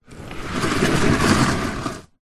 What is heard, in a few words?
foley luggage suitcase leather laying on side drag push hard ground medium